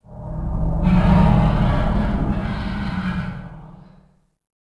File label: Motor Sucky; Ambient; Sid Meier's Civilization 4 Complete; Beyond the Sword